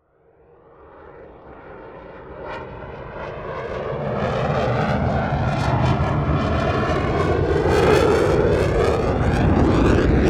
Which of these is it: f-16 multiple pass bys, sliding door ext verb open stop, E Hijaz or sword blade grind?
f-16 multiple pass bys